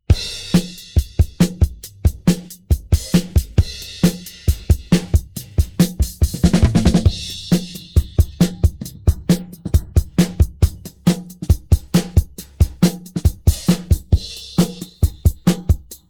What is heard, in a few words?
pattern, drums